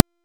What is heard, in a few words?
Death Screams; Dante; Warframe